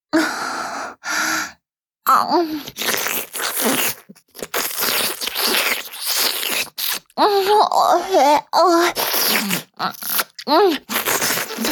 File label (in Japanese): フェラチオ(中):陰茎を頬の内側でしゃぶる, チュパ音; チュパ音抜粋集